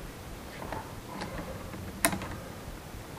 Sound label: female child crying